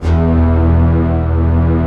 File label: fast strings, Fast octave; Optical Media International; Sonic Images Library